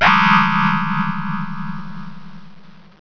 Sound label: bend up; Goldwave